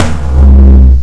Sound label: Tom (F3); Guitar Rock Tour